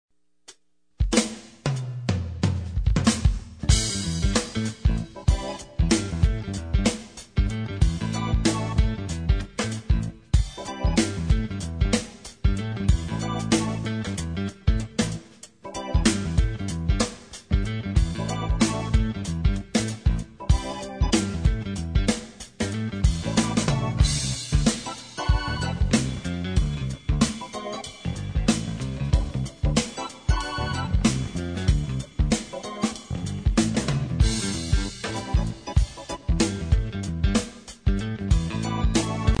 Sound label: funky